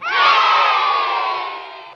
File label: "yay", kids cheer; ooh ahh